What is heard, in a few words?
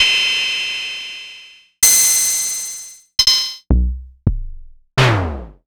Korg MS-20 Drums